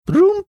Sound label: mimicking notification sound